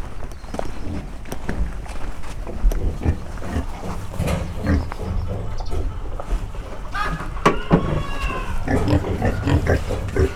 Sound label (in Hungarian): disznók, kára, tyúk, parasztudvar